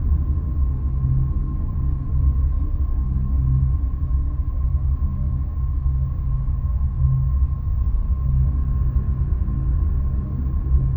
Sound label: construction platform hum; Stellaris; utopia